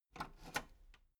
metal lever button radio enable slow

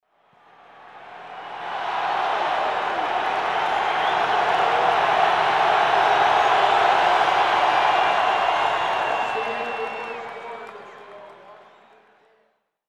football stadion atmo

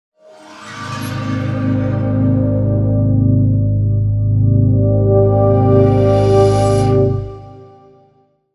glossy sweep

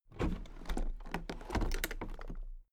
toolbox open soft

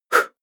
female breath fast out

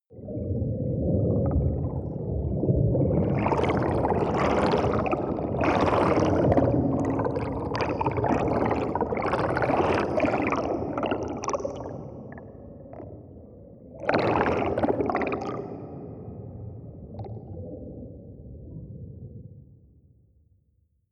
water fx

